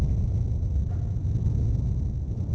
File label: volcano; The Elder Scrolls III Morrowind GOTY; XBOX